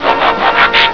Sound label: transform up